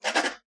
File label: pen write; soundscrate